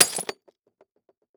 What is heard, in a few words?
Impact Cinder Block Drop On Ceramic Tile